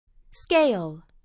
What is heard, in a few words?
scale